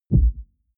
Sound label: sub bass